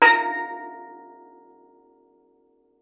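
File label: STEEL DRUM; E-MU Producer Series Vol. 7 – Old World Instruments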